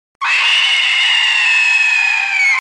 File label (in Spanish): Grito de terror Efecto de sonido